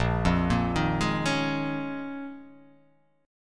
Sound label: Bb Minor 11th, Bbm11 chord; arpeggiated; JGuitar